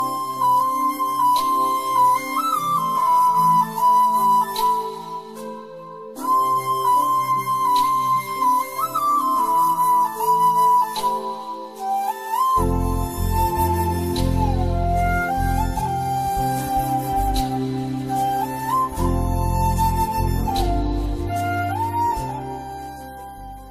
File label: love music ringtone, bollywood